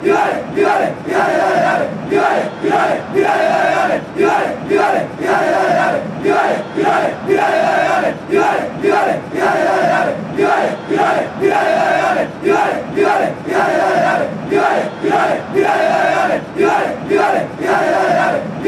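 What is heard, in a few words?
y dale dale dale, football chant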